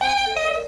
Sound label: venonat